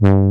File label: JX BRASS, Jupiter Synths; E-MU Formula 4000 Series Vol. 3; Analog Odyssey